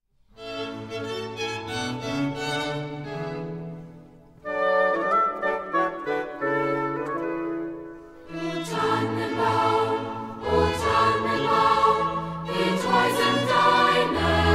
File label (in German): Kinderchor